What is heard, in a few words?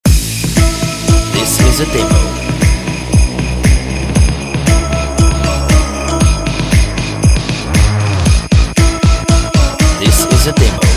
Christmas Electronic 117 bpm